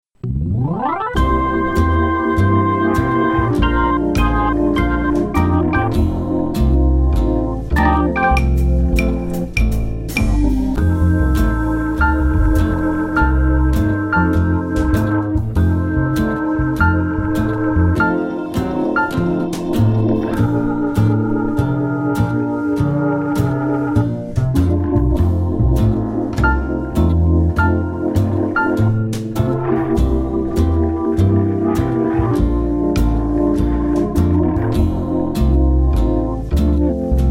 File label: klarinetten